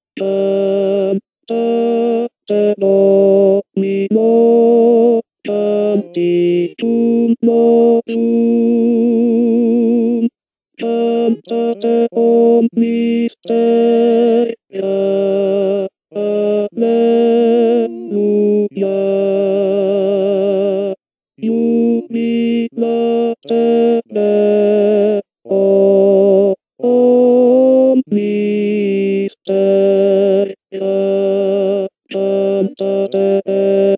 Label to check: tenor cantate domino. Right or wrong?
Right